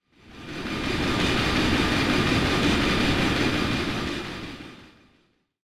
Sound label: heartbeat; superstriker